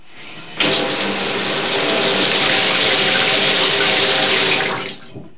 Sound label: bath; My Science Fair